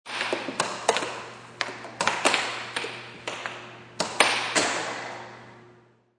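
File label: Air Hockey Short, Air hockey game; Part of Air Hockey (Sound Effects:Games)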